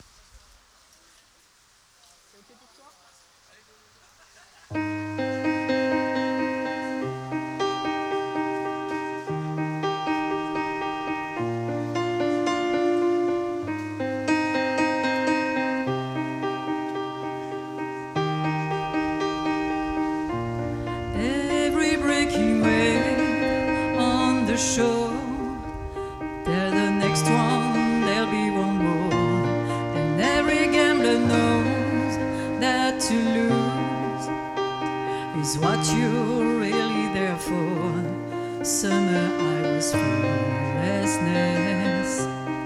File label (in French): Duo Piano Voix